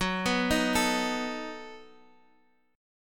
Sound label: B 7th F sharp